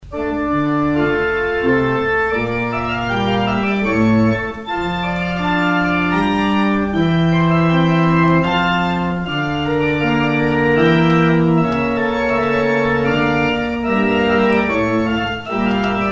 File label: organ outro; Organ exit music